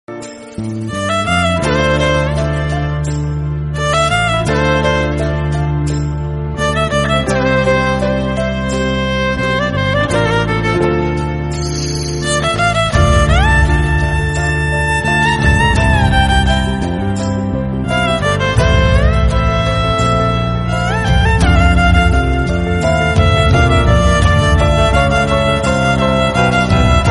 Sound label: Telugu Indian Army